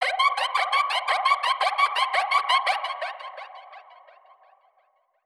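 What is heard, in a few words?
alarm siren, modulation processed, 170bpm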